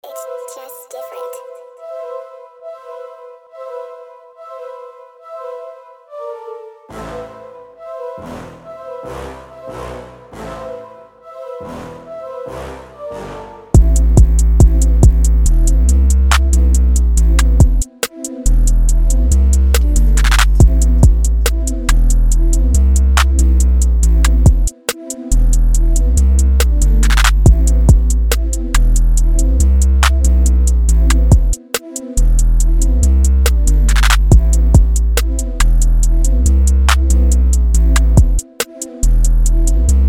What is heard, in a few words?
140BPM D MIN